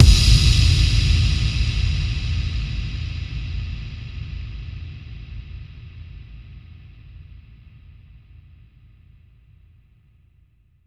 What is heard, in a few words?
Bassdrums FX, FX Kicks; Vengeance Samples